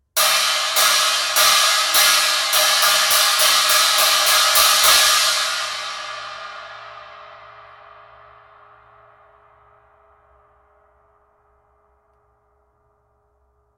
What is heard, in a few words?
21in Ultra Hammered China, cymbal